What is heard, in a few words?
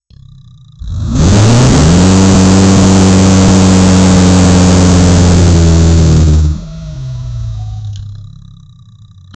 the maximum roaring exhau